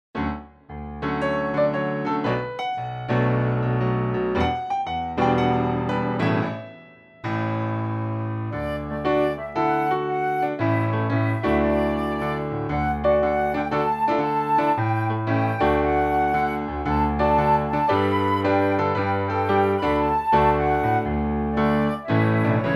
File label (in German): Klavierbegleitung